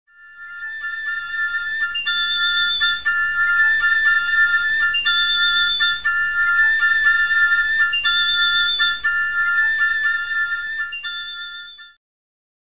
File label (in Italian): acuti e gravi; esercizio; Sonora vol A